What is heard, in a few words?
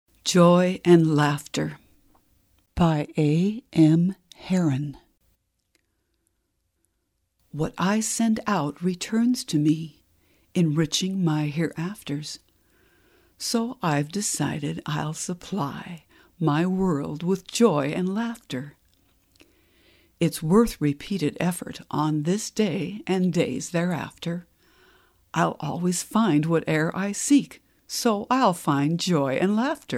JOY AND LAUGHTER